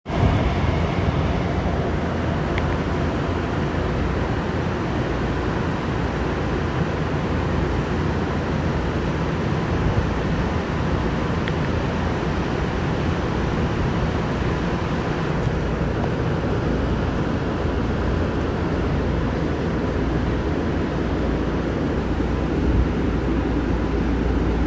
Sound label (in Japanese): 副都心線東新宿北